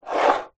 household aftershave or perfume glass bottle slide on wooden dressing table; zapsplat